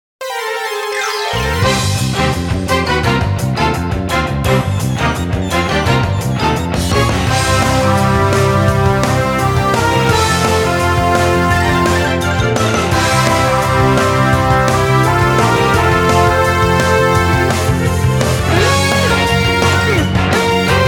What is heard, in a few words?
battle wild pokemon; pokemon x y